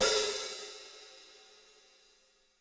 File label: High Hat Open